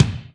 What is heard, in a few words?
Big Foot, Alesis HR16B drum machine; 300 Drum Machines